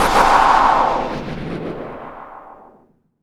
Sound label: NOISE, Production Effects